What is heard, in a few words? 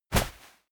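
step walk textile medium, footsteps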